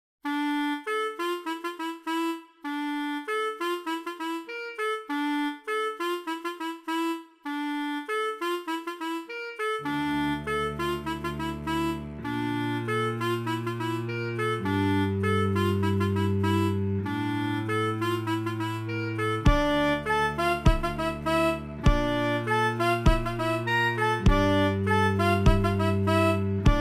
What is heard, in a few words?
clarinet